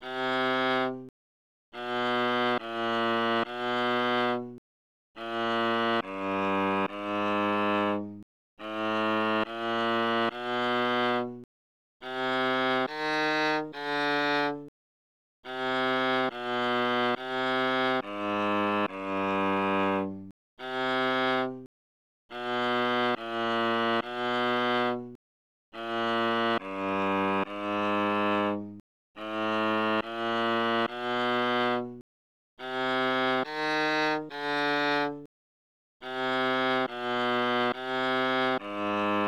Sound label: weird sad song